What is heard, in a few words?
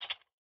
Tool; Game rip; MiSide